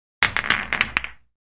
Billiards Ball Othe

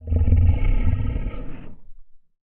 monster growl, breathing

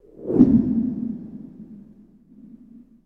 Stinger Sci Fi Whoosh

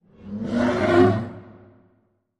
Animal Stinger; stingers & whooshes

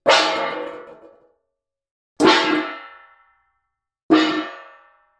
Pot, Saucepan, Fall x3

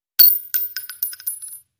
Shell Case Sngle Tube, shell casing movement

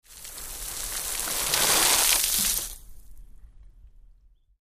Large Sea Sprays Fall, bow wash

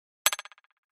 Flash Gear HF Elect Hi, flash gear

